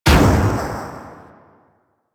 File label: Super Weapon Bomber Explosion; Warframe; Caliber Chicks